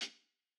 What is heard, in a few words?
Jazz hop hihat; Hiphop